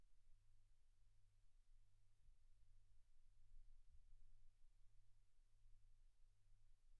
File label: silence 48kHz